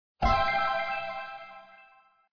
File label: match; fairies